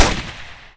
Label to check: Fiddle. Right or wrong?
Wrong